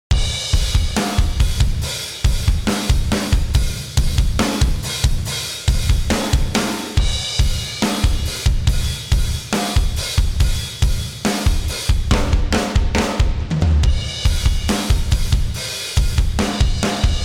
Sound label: Black Drums Through The Nuker